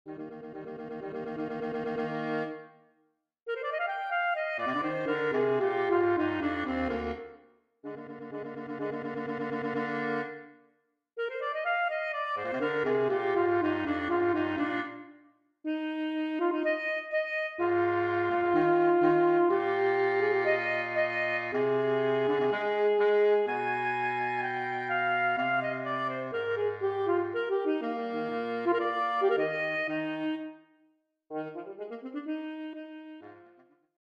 Duo for Cello and Bass Allegro Molto; Rossini

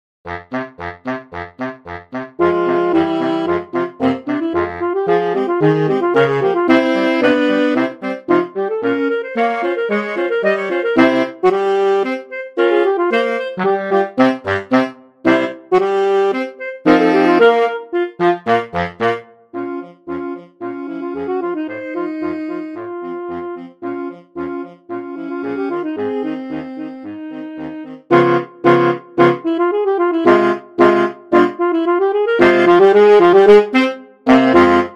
Gypsy Dance, sax quartet

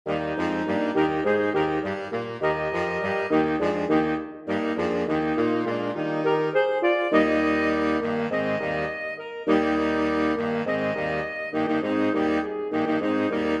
Halleluja Chorus Quintet; Handel

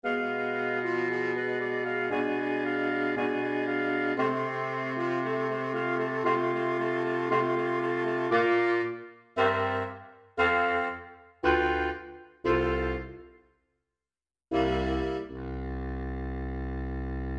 The old grumbler sax quartet; FUCIK; Saxophone Quartet

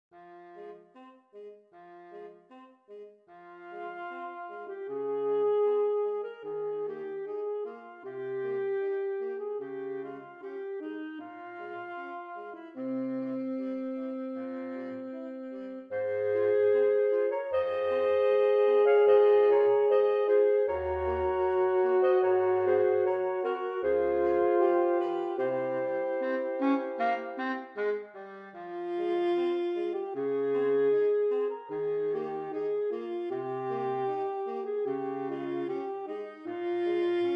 Pavane Op 50 sax quintet; Faure; Large Ensemble

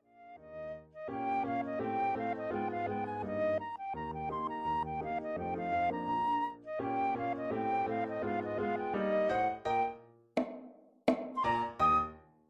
Ragtime Dance Tag Ending

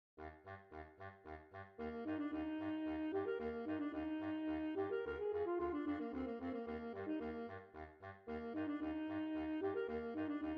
Duo for Cello and Bass Allegro Vivo; Rossini

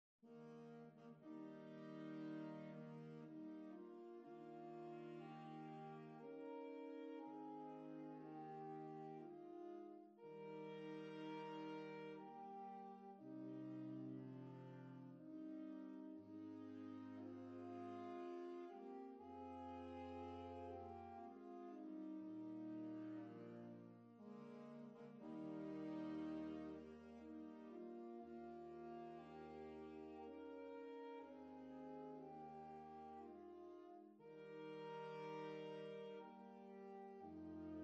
Nocturne Sax Choir; Mendelssohn; from A Midsummer Nights Dream; Large Ensemble

